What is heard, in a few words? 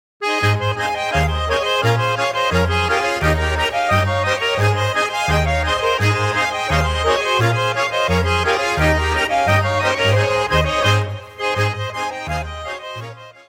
Polka